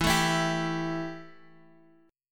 E Minor Double Flat 5th Guitar Half Step Down, chord; Embb5